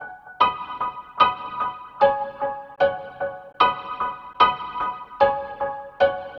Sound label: Piano, reggae; newrootsreggae